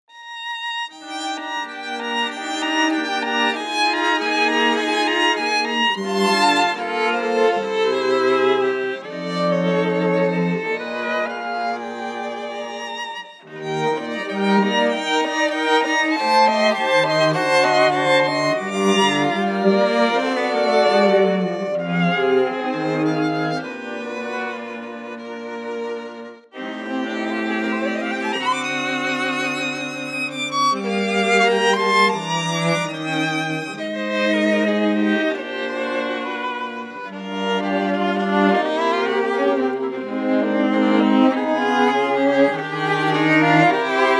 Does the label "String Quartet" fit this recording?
Yes